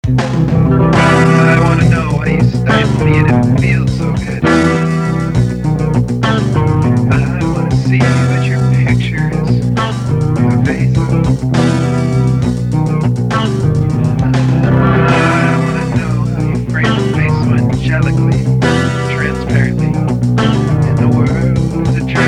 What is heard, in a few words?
Quavering Ambience; DouGR